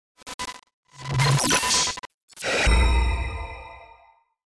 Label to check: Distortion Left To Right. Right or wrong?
Right